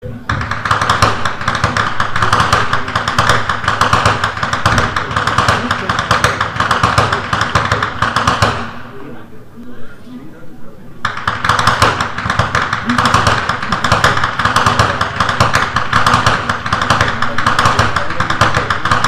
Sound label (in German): schnalzen; saaldorf